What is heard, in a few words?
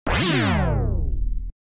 Vroooeewoor; Galaga '88